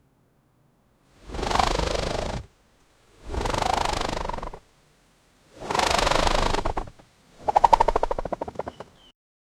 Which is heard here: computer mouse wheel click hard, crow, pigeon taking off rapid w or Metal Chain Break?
pigeon taking off rapid w